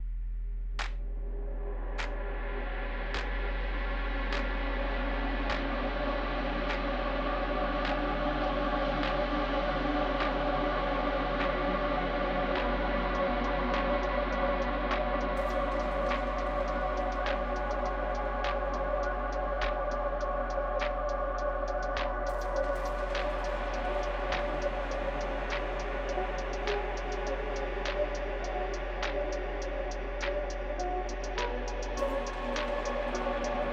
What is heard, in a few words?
Light Cardio Workout Soundscape